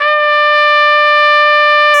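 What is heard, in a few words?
BRS RCA TP, trumpet RCA jazz; Roland L-CDX-03 Disk 2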